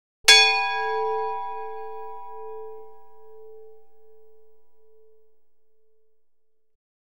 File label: Lutine Bell, Lloyds, Rung Once; BBC Sound Effects Library; Big Ben Taxi Bus Atmospheres